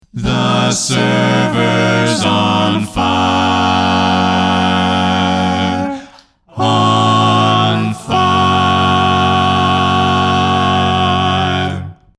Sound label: Barbershop